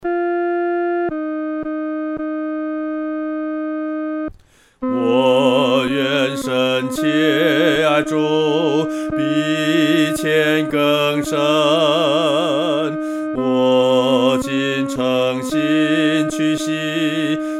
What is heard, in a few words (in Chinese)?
独唱 第二声